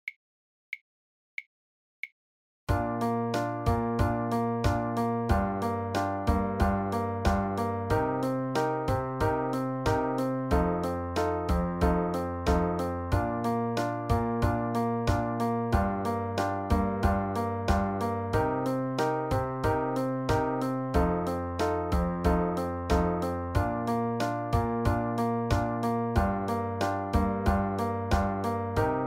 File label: Improv Practice in Bb